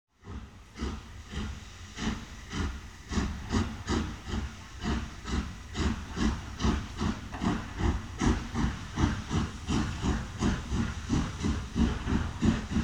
Sound effects, Steam trains

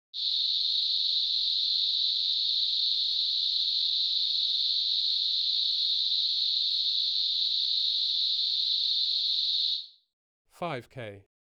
1,3 octave band, 4 kHz

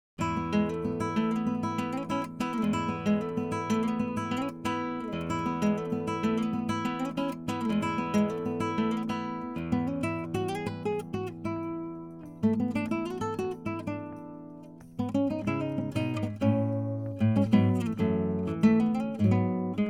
Instrumental, Nylon strung Spanish guitar

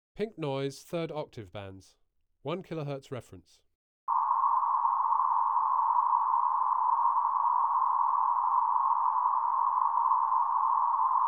1,3 octave band, 1 kHz ref. tone

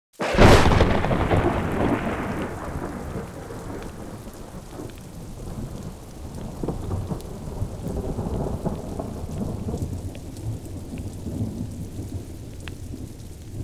Sound effects, Thunderstorm

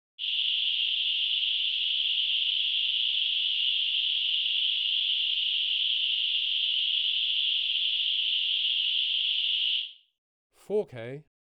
1,3 octave band, 4 kHz

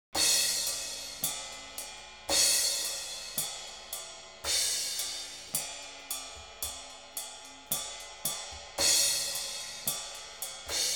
Instrumental, Cymbals & hi hat

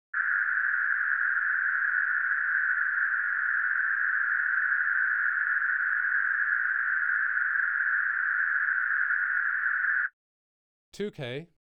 1,3 octave band, 1.6 kHz

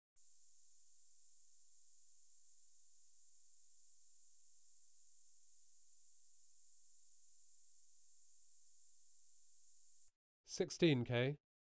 1,3 octave band, 12.5

